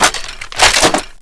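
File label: reload Light Machine Gun Clip Out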